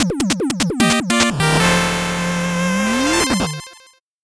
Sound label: 8bit jingle slow